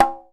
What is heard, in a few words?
CONGA SL; Univers Sons; Basicussions